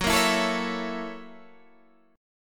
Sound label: F sharp 7th Sharp 9th, Guitar, Open G